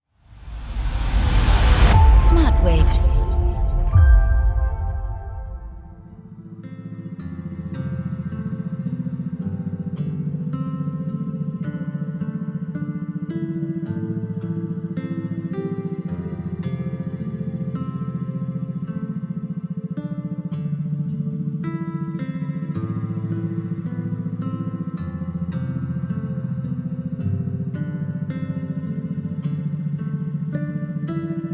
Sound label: chilled focus